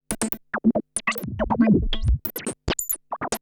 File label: SPACE FX 140; SpaceSounds